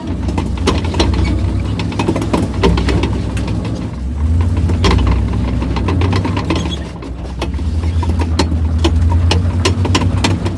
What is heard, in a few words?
tracks light speed, tanks; War Thunder